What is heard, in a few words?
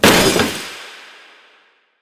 gacha open